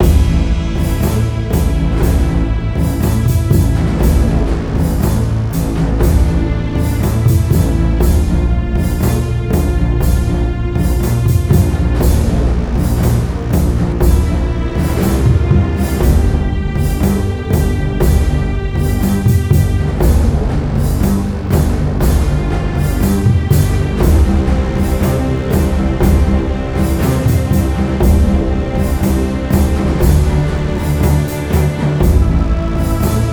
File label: battle; The Warlock of Firetop Mountain; school of sorcery